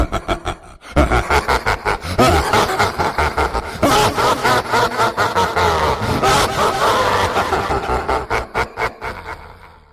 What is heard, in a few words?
laugh; sisyphus